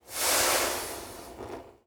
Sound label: Steaming; SoundsCrate